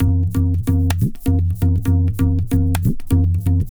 tabla, 130 bpm; Zero-G; Pure Tabla

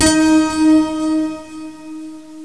piano new age; jazz jackrabbit 2; M8

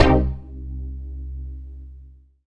synth, dance synth; Sound & Vision - Gigapack I CD 2 (Roland)

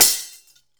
DAF HIT; WorldSounds

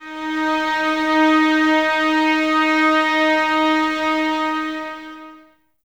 violins D#4, string ensembles; Miroslav Vitous; 11 Violins

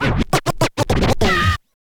scratch kit, vinyl

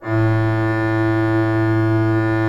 HGRP A1, Cathedral Organ, HOLZGEDACKT; Propeller Island

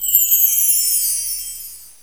windchime; jazz jackrabbit 2

